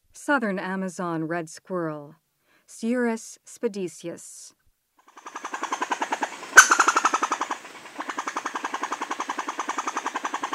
Southern Amazon Red Squirrel; S. America

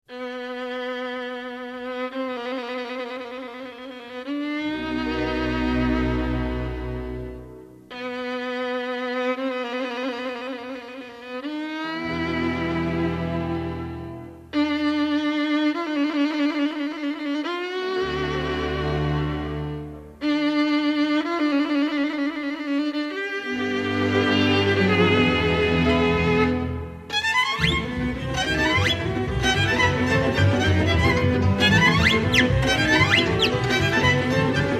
whistle-hora, music from hungary

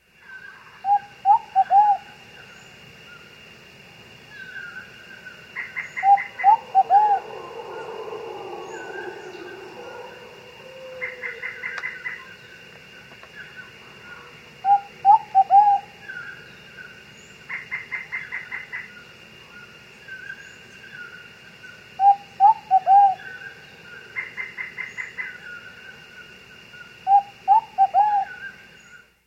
Short-billed Pigeon, S. America

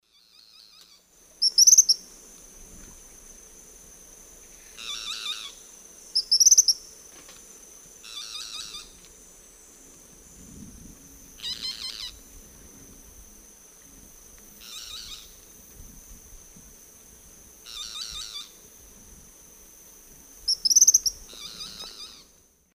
Tyrannus melancholicus, song; S. America; Eastern Lowlands

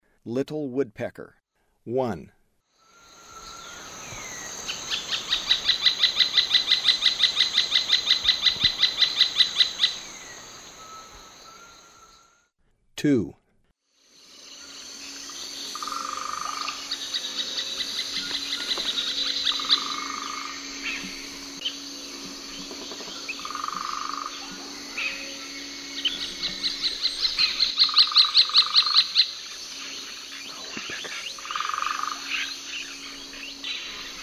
Little Woodpecker; S. America; Eastern Lowlands

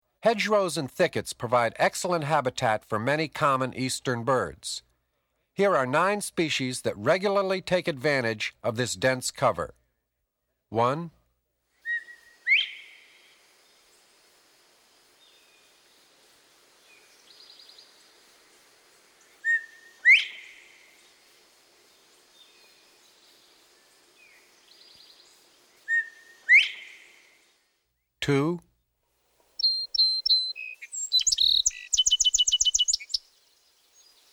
Hedgerows Thickets; Eastern and Central North America; Birding by Ear; Peterson Guides 2009

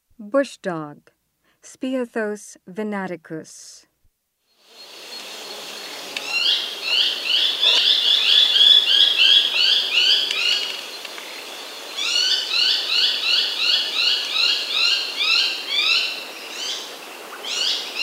Bush Dog; S. America

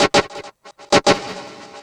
Synth, Techno

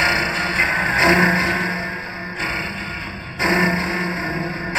Grind Strings, Electronica Strings

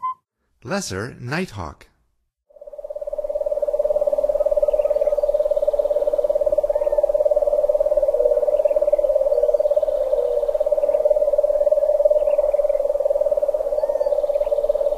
Lesser Nighthawk; North America; Stokes

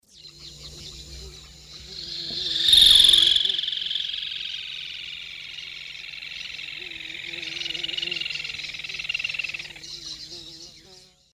Laterallus melanophaius, song; S. America; Eastern Lowlands

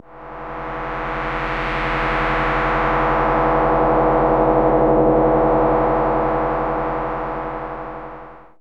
ELECTROCUTION

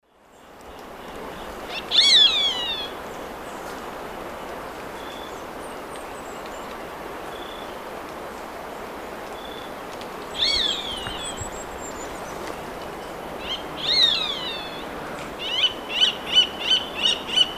Buteo magnirostris, pair calls and song; S. America; Eastern Lowlands

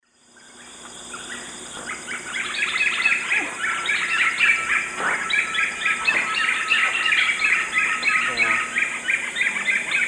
Monasa morphoeus, group song; S. America; Eastern Lowlands

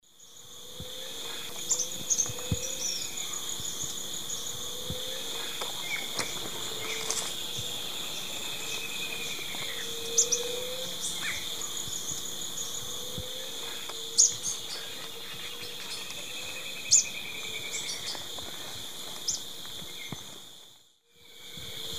Myiobius atricaudus, calls; S. America; Eastern Lowlands

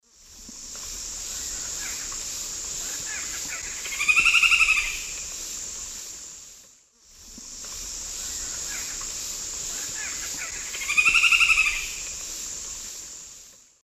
Chondrohierax uncinatus, call; S. America; Eastern Lowlands

